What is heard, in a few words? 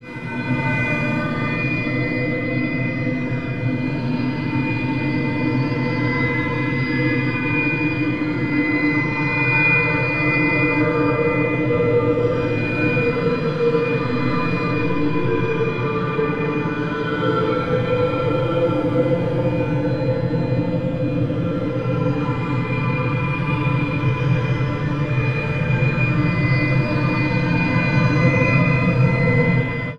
gtr FX